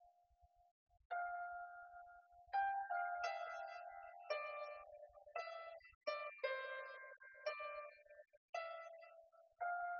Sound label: nodrums liverec 250ms 5cm sim; SiSEC08; SiSEC underdetermined